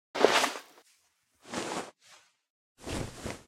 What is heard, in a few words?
outfit inspect; Outfit Animations